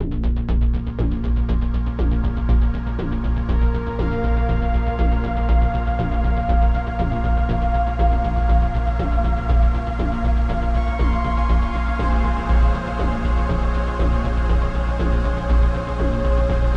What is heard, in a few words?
Pads Loop